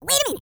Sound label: Vocal, R&B & Dance; ILIO; Vocal Planet VOL-4